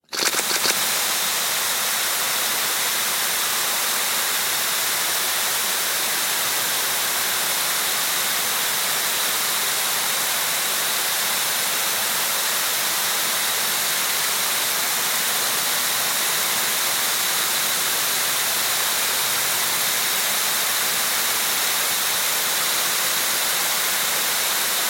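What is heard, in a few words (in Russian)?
звуки датчика дыма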